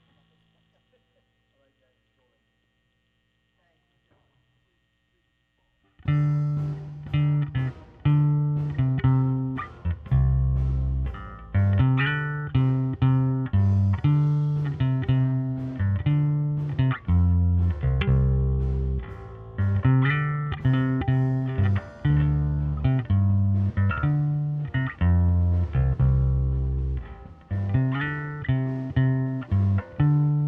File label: Bass